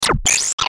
Glitch Zap Pitch Change, Glitch Short Buzz Warble; Production Element Imaging Element Accent Transition

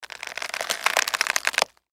Flesh Carcass Rip Tear Body Rigor Mortis; Injury Sound Effects; Horror; Blastwave

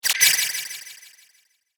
Cartoon Magic Spell

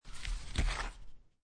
Playing Cards Gather Cards From Table; Card Sound Effects; Casinos

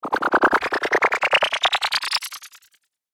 Teleport, science fiction

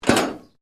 METAL CRASH SMALL

